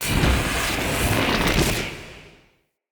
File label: Tesla Mini Game Charge Pre Shot; Encounters; Ode Pollution Tower